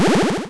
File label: powerup